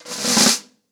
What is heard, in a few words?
Snare Cres, drums singles; The Roots Drumloops